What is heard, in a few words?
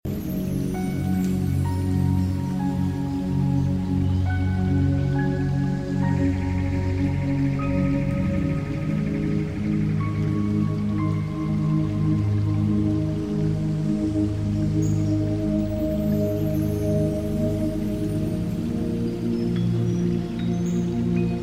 Celtic Meditation, Celtic Music with Chimes, Birds & Running Water; Deep Sleep; Loopable